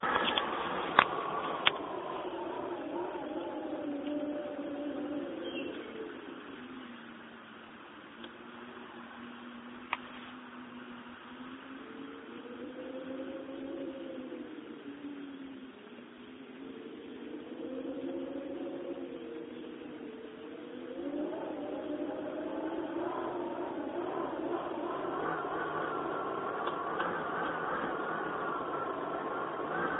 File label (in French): vent